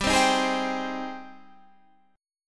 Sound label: Ab Major 7th, chord